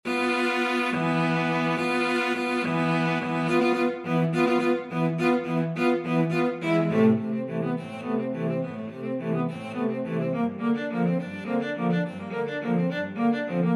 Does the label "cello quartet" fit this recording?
Yes